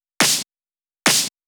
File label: Clap, 140 BPM; Vengeance Samples; Vocal-Hook Kits; Song Kit